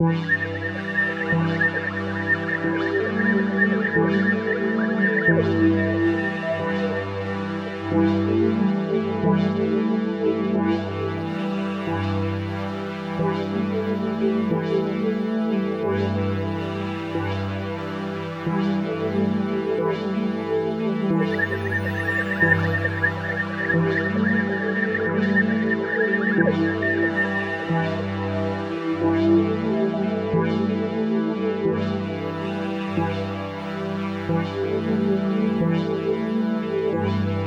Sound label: System Distort